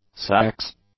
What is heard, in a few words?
sax